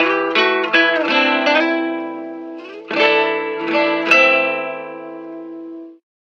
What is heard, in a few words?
Game Over Guitar, ringtones; Pantech Breakout